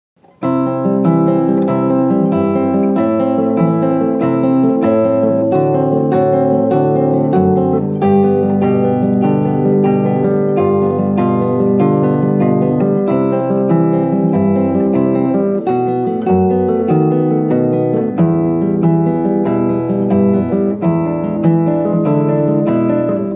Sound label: etude arpeggio